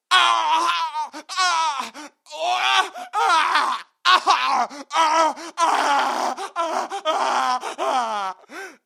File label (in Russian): zvuk boli i krika ranenogo